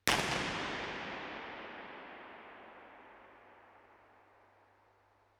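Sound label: warehouse omni, impulse response, factory warehouse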